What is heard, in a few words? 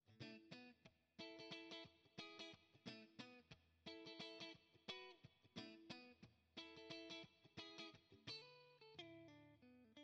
Amp Mix 100; ToneX Pedal